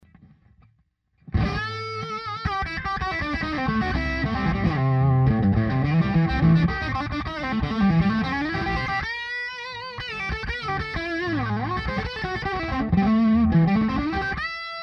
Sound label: Bridge Pup